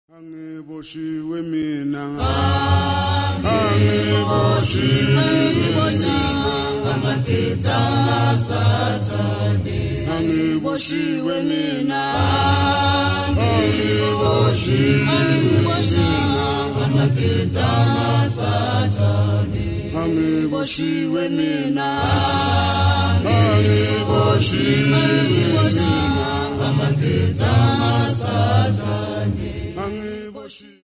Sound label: Church of Christ Choir; Ngangiboshiwe